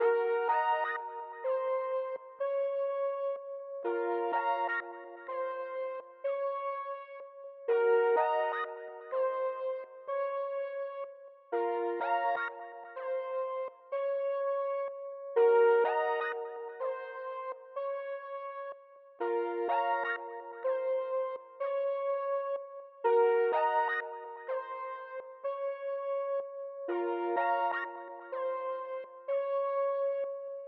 125 BPM A# Min Bells; Melody; Loop Stems; Cymatics; 2022 Melody Collection; Wavy; Power

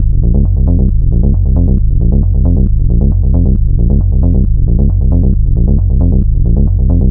Bass Loop G# 135, Techno; Ghosthack; AC2024 - Day 1 - Techno Sounds 2025

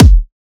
Riddim Kick

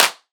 Riddim Clap

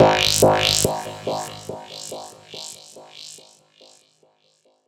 Rhodeo G# 142 BPM, Synths

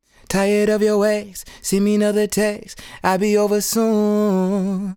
Sung Phrase Dry, 90 BPM F# Min, vocal; Tired; Cymatics; Euphoria Vocal Sample Pack

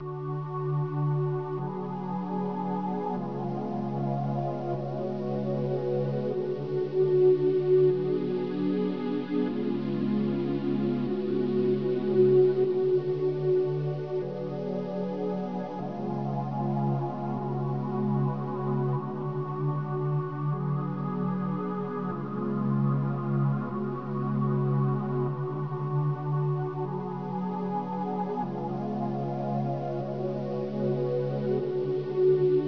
152 BPM F# Min Pad, Hip Hop; Melody Loops; Loop Stems; Cymatics

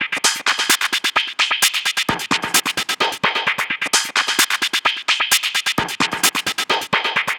Perc Loop 130, techno; Techno Sounds 2024; Percussion

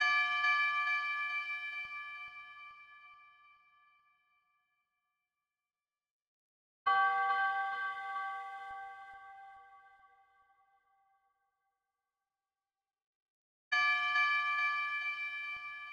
140 BPM D Min Bell; Loop Stems; Drill; Cymatics; 2022 Melody Collection - Drill; Woi

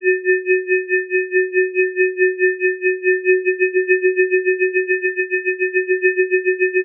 Riddim Melodic Hit F# Ringaling; One-Shots; Melodic Hits; Ghosthack; Riddim Essentials Volume 2

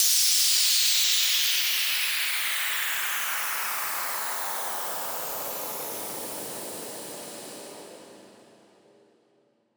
White Noise Down; Cymatics; EDM Starter Pack

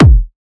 Kick, Techno Drum Shots; Drum One-Shots; Ghosthack; Free Techno Drum Shots 2024

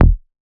Raw Bass F

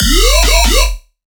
Riddim Bass Hit; Yah

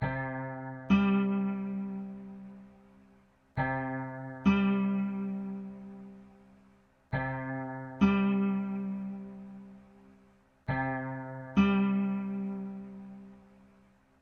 135 BPM C Min Guitar; Loop Stems; Cymatics Gems Vol 12; Gems Free Teaser Pack; Monster

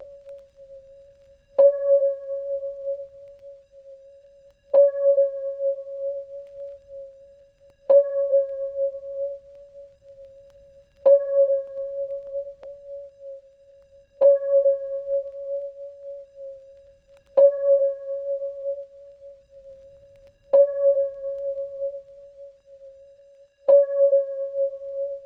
76 BPM A# Min Keys; Lofi; Melodies; Loop Stems; Cymatics; Lofi Starter Pack; Imagination

